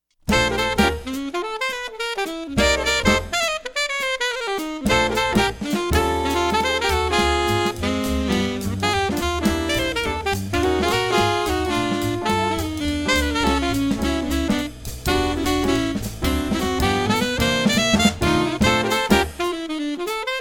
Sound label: sax duets altos; Fairbanks Court